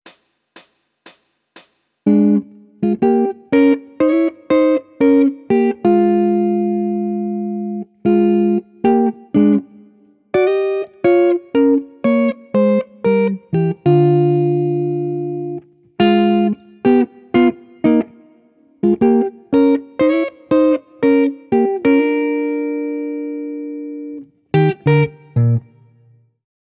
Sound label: Jazz Chord Melody, Guitar